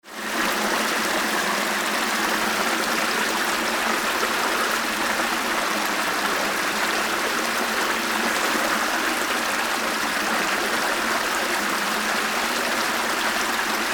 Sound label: stream medium